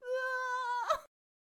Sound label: Peasant Death Vocals